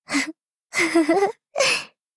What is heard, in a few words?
laugh